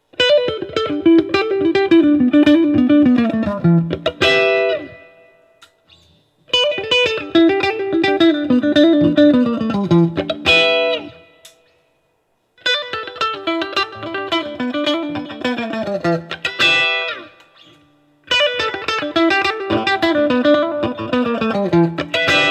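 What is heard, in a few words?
Guild M80 CS Tweed Solo, guitar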